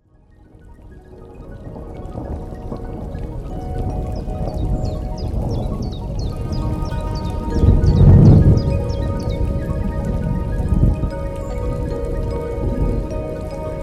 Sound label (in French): Bruitage l'orage et la pluie